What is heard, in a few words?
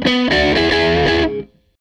Tele Guitar Loop